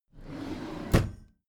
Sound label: packed drawer smooth open regular